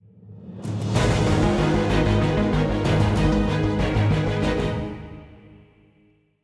190bpm; Legends of Pegasus; windows gamerip 2012

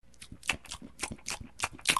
Low Food; 30 days to survive 2020 gamerip

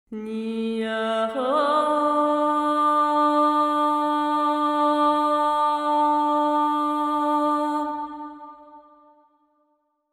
singer, soundtrack; Tyranny Gold Edition

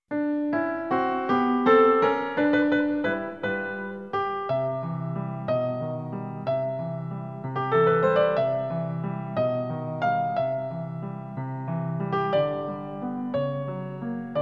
Underwater BGM; Tanoshii Beyer Heiyou Super Mario World complete music collection 1993

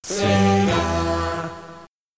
Logo; Hellfire Saga; Sega

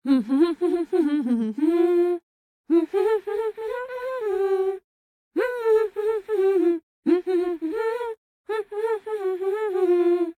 Voice song, en

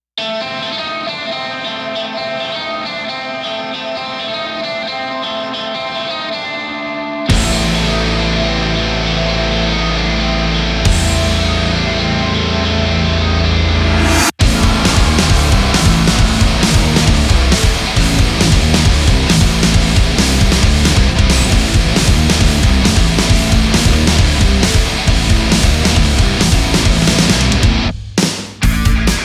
Karaoke; yakuza kiwami 2 unreleased soundtrack ps4 windows xbox one gamerip 2018

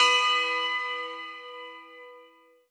orbit tubebell; Sky Children of the Light gamerip

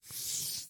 Marker; miside demo windows gamerip 2023

